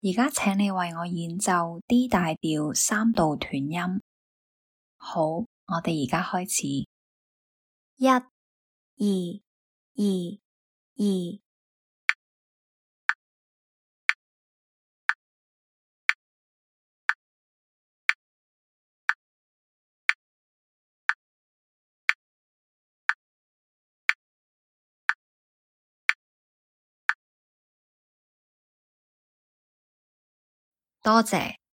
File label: d maj scale 3rd apart staccato